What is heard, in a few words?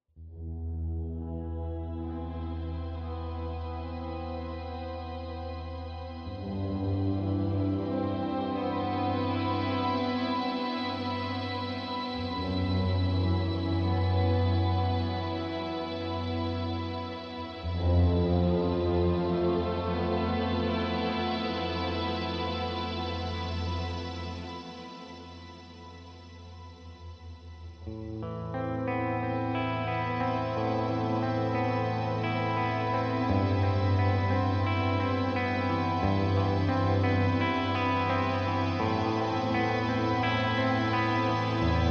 Nocturnal Shimmer